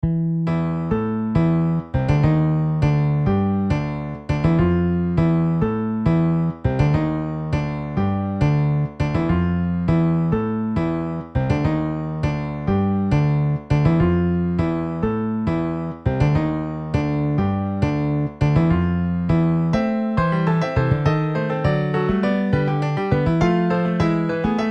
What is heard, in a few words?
Piano Bass MIDI